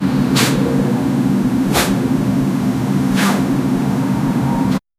soft sweeping sounds, city

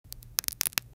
the sound of a crystal

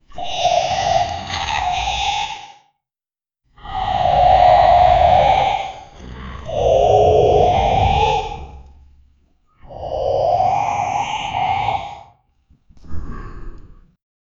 voice horror animal

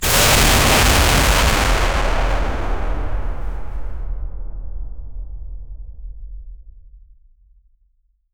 glass explosion burst

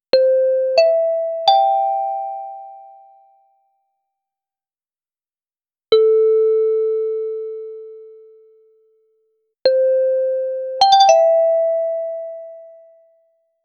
versatile eleg, quiz

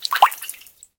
fluid gurgle splash; sprinkle

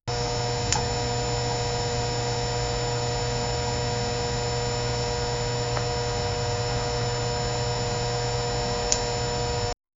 battery powerbank se fiss

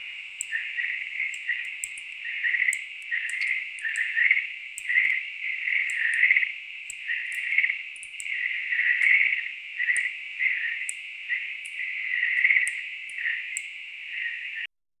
nighttime swamp ambience

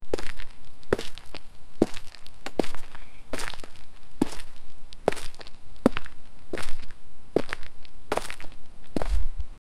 footsteps, far

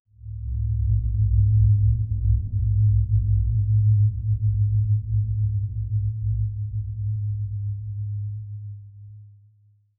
cinematic

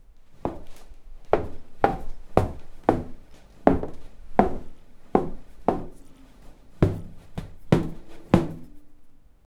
solid non slip shoe step

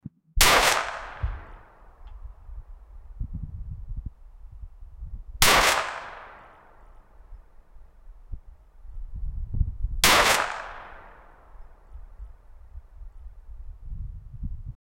the sound of a luger, gunshots

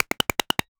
generate a short cartoon, tool

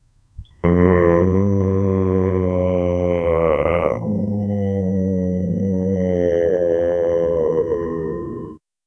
a low tormented moan from, creature vocal

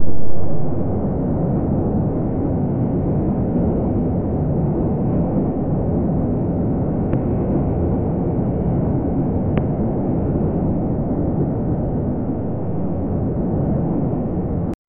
kraken mitologic monsster, mythical creatures

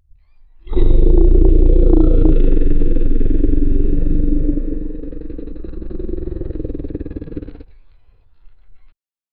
drowning speed, bubble

